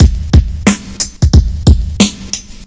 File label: Dope Drum Loop; Omar; omar s